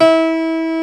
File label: STENWAY; East West; Global Sounds